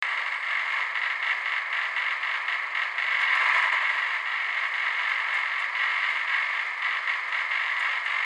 dirty rhythm, ambience

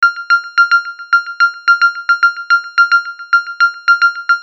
arp synth hi rhythm

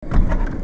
clang rhythm, ambience

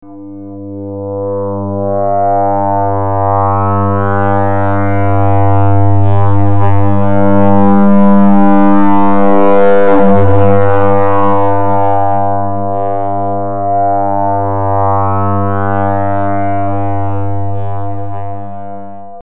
long rez disto drone

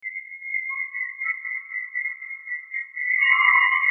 dirty rhythm - noise reduction

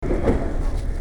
clang rhythm, ambience

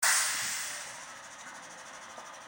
bus hiss, ambience

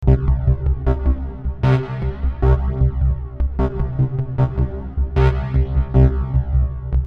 phaze pulse, synths

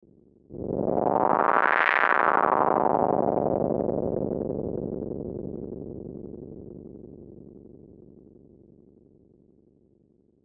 magnetic field, synths

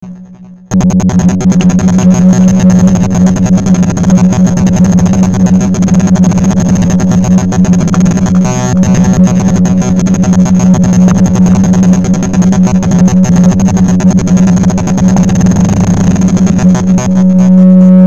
tek drone

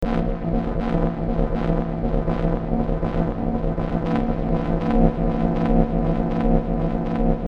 dirt puls, synths

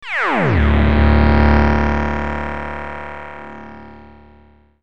wait for it note

